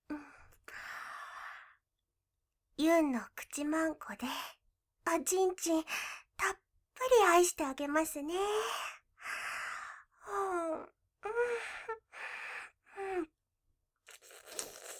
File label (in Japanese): ゆっくりピストン; ダミーヘッドバイノーラル; VOICE LOVER